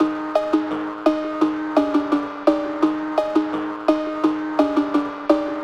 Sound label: Dark Cowbell Melody Loop 85bpm